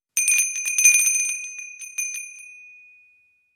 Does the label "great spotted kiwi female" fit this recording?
No